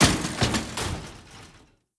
Bicycle Crash; blue archive

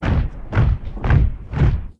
Army; blue archive

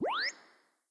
Rise Up Short; blue archive

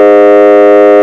horn, sirens; code 3 h2

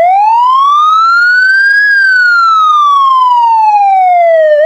wail, sirens; code3 z3

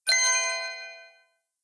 Chime; blue archive

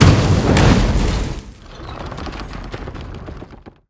Heavy Tank Death; blue archive

